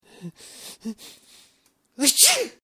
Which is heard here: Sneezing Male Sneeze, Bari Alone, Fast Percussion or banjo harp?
Sneezing Male Sneeze